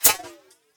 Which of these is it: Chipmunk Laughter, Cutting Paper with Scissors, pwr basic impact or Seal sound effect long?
pwr basic impact